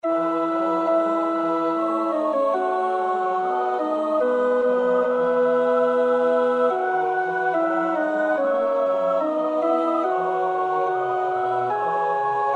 tenor, koorpartij oefening; Bach (Johann Sebastiaan); BWV 124

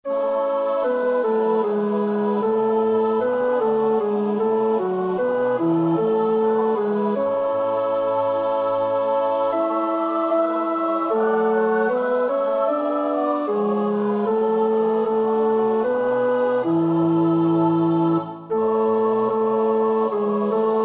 tenor, koorpartij; Bach (Johann Sebastiaan); BWV 197; Du süße Lieb, schenk uns deine Gunst

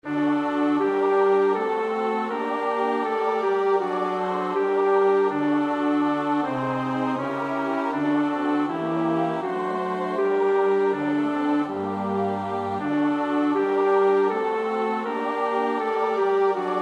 bas; Bach (Johann Sebastiaan); BWV 002; 6.Das wollst du, Gott, bewahren rein

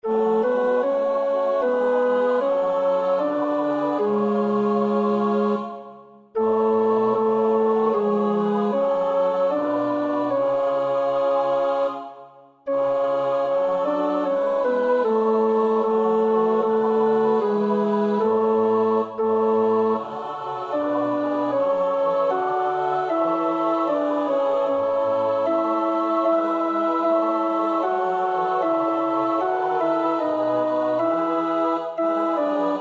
tenor, koorpartij; Bach (Johann Sebastiaan); BWV 148; Amen zu aller Stund

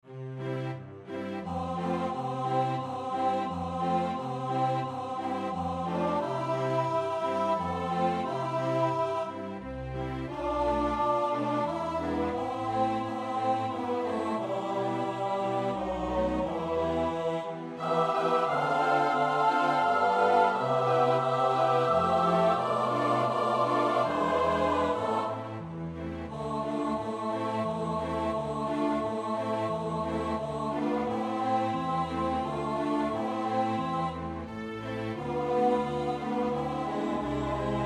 S-A-T-B, koorpartij oefening; Puccini (Giacomo); Messa di Gloria

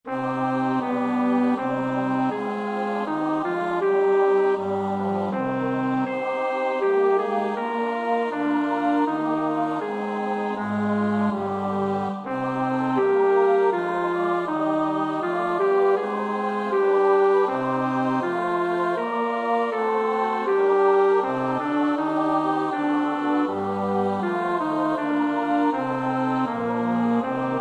bas, koorpartij oefening; Bach (Johann Sebastiaan); BWV 102

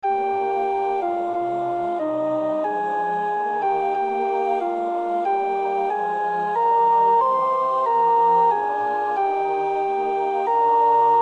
sopraan, koorpartij oefening; Stainer (John); The Crucifixion

